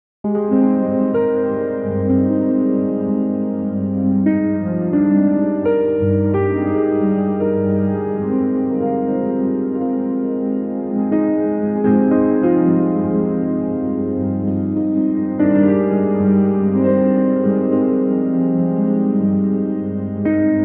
emotional cinematic track; empowering

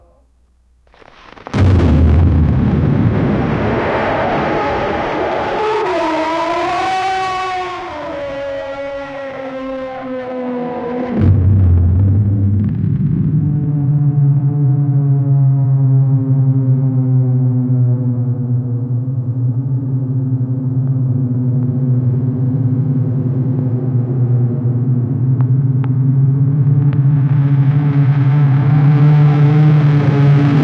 giant monster steps deep

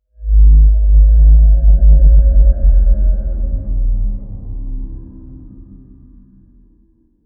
mythic item drop ethe, ethereal hum

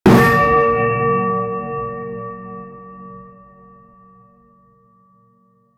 epic ring bell

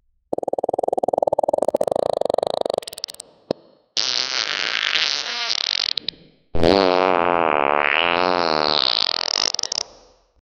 series of long slow wet, creature

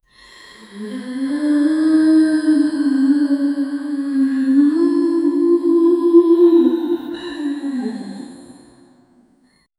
moonlit sorrow woman wee

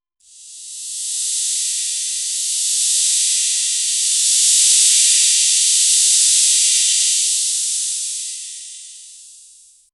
slimy snake coiling; reptile

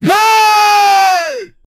male character scream whe, falling

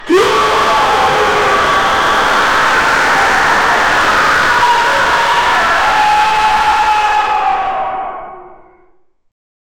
a massive discordant roar

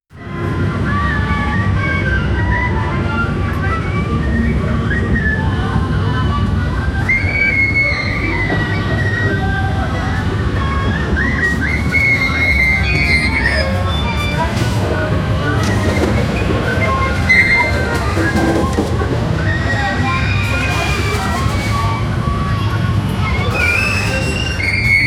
children roller coaster, amusement park

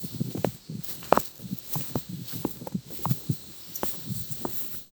grass walking sound, pixel art

